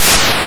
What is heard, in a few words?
Snare, YM, CHIPSHOP HITS DRUMS; ChipShop Deluxe